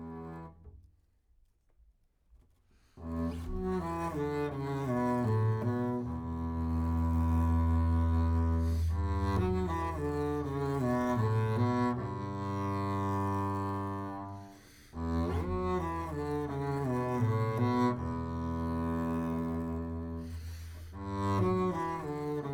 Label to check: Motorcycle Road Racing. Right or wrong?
Wrong